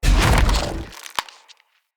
Root Spider Mother Foley Jump

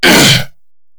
Grineer Hammer Boss Pain, Vocals; Warframe

